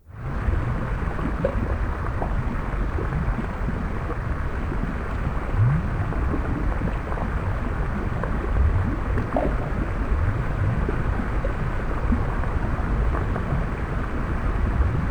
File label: Lava Loop; yo frankie